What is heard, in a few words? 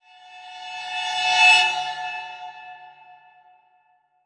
Transition Musical Tone Fly By; Production Element